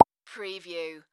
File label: Mouth Pop Finger